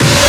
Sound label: breakbeat